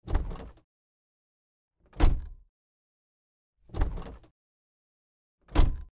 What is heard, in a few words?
50 packard door inside